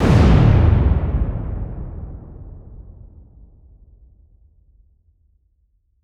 Explosion Demolition Large Deep Powerful Blast Dynamite; Fire and Explosions